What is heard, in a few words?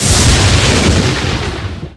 lightning spear cast